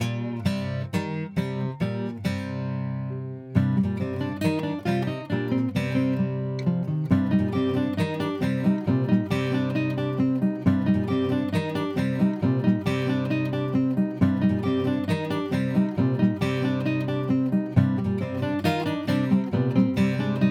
Guitar Dry; eventide recirculate

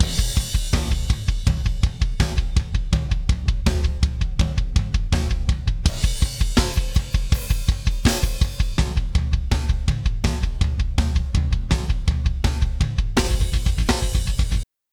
Drums Dry; Oeksound Bloom

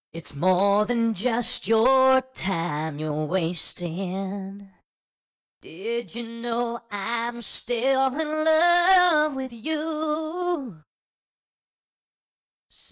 Vocals Wet; soundtheory kraftur

